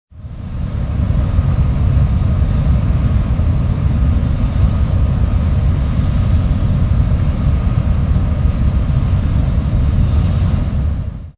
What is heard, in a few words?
separator, angelspetrochem; Factorio Paranoidal mod